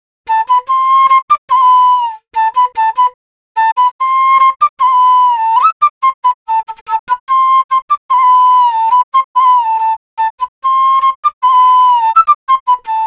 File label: Drill Flute Loop BPM 145 A Min